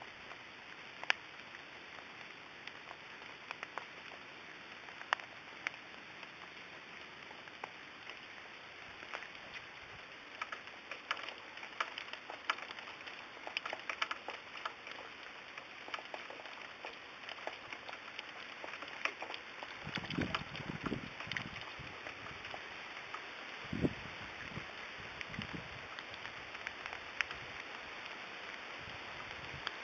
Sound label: rain wind; from ts ida